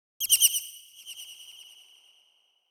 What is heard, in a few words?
Small Bird, Very High Warble - High; Hollywood Edge; sound designer's toolkit v2